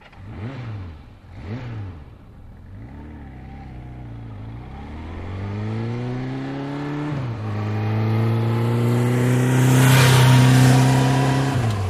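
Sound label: Motorcycle Kawasaki 750 Start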